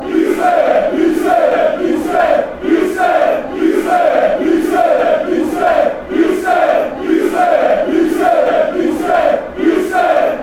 bolton chant

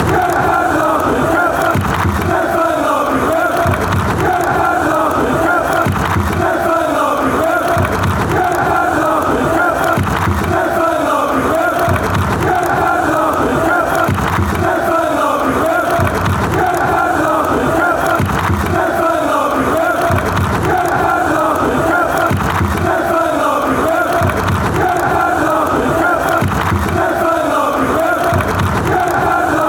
fight rapid, chants

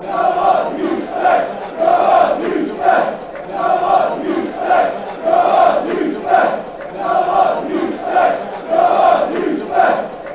mansfield chant

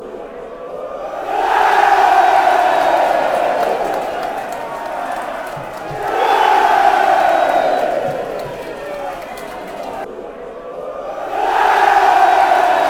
cheers, fanchants

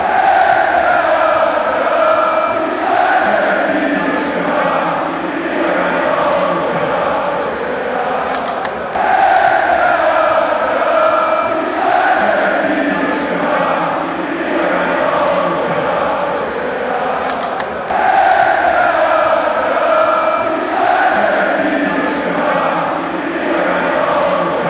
rapid chant

